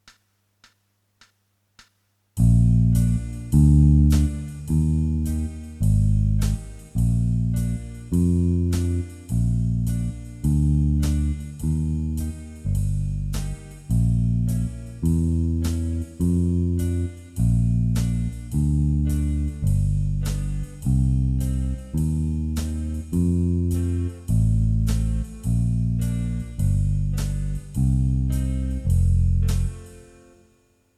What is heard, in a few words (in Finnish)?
vaihtobasso